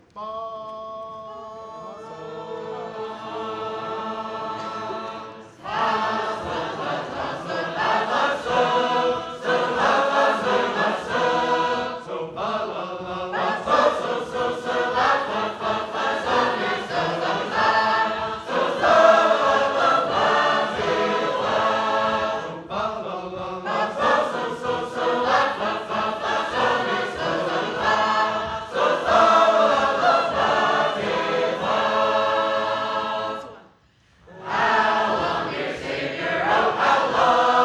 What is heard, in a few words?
Northfield, sacred harp